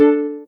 soft hitwhistle; osu

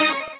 normal hit normal

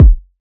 normal hit whistle

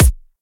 bass drum

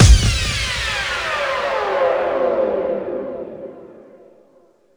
normal hitclap

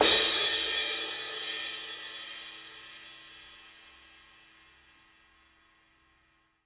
crash; osu

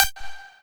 lead

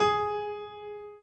Piano Chord

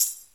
normal hit whistle

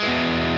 E Arpeggio up

